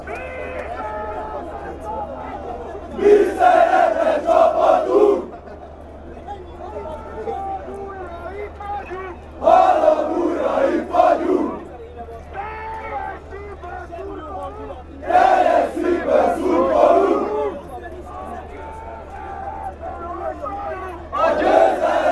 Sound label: shouting, hungary fanchants